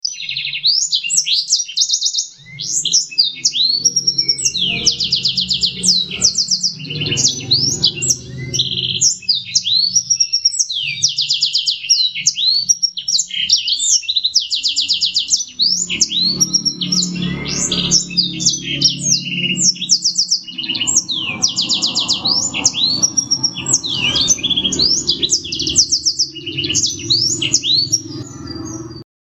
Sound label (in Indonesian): audio blacken garuda